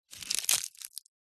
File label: foley dry thick leaf or twig crunch break crush; zapsplat